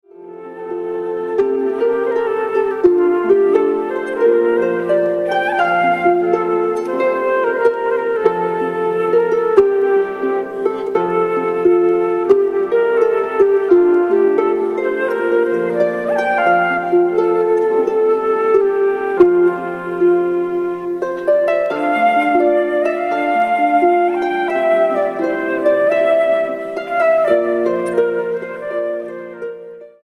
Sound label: Lullaby